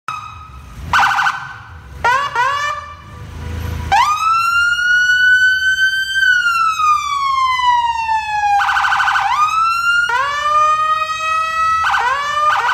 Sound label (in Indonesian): nada dering polisi ringtone